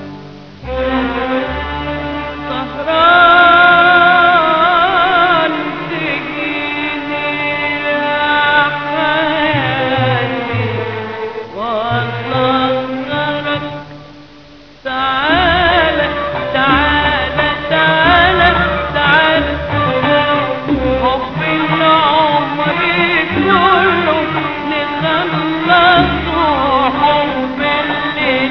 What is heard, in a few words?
arab music